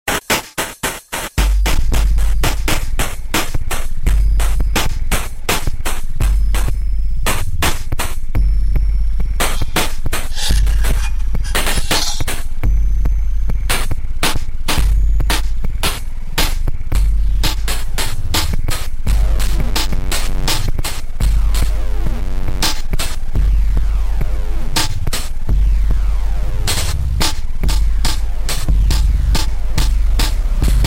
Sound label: noisy